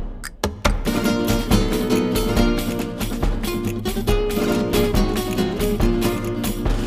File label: music world music shooter swarm